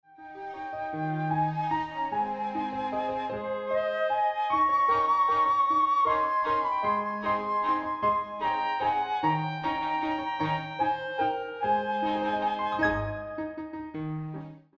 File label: Waltz Accompaniment